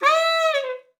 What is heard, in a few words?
sax e5, Saxophone slides; Session Instruments; Best Service ProSamples vol.42